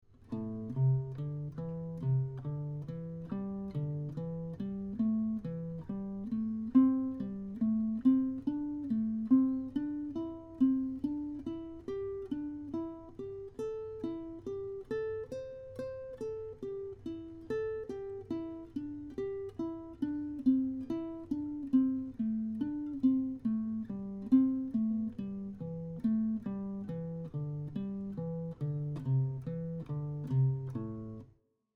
Consecutive 5 note pattern, minor pentatonic scales on guitar; Consecutive 4 note pattern